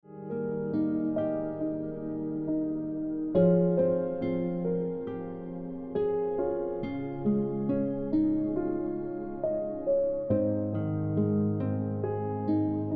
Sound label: Ringtone Piano Music